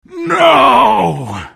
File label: death; lion